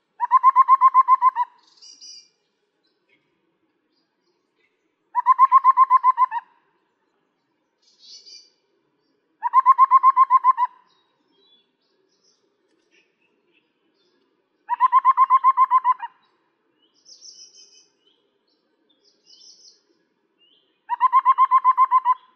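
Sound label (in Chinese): 小彎嘴, Yushan National Park; 小彎嘴畫眉、棕頸鉤嘴眉; 畫眉科/留鳥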